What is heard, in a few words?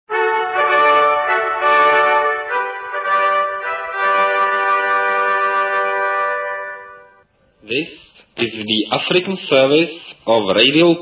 swahili, interval signal; tch; radio prague c1975